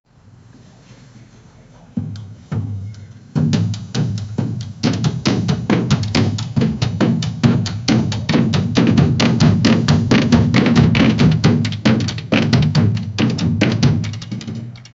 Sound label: sound of drums intensifyi; celebration